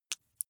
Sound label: flesh wet greasy squish single